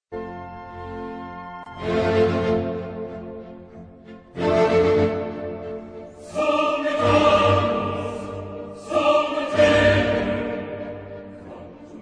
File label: chorus; Mendelssohn; Lauda Sion Op73; Sumit unus sumunt mille